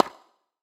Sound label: tock